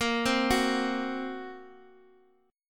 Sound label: A sharp Suspended 2nd Sharp 5th A sharp down; arpeggiated; JGuitar